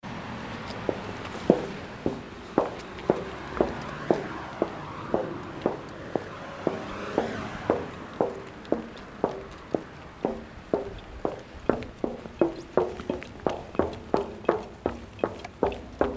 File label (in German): Brückenlauf